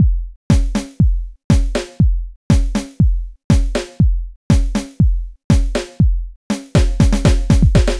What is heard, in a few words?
basic 4 bar 120 bpm; hydrogen